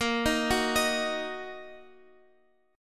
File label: A sharp Suspended 4th Sharp 5th A sharp down Guitar Open F; arpeggiated